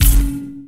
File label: menu back; o!mania Skadi Arrows